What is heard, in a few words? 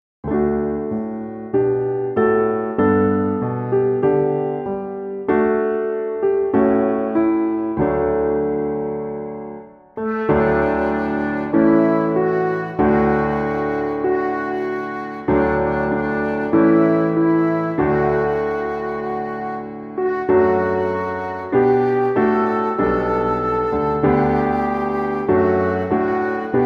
instrumental; Our Father